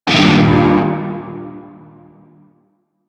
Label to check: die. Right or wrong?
Wrong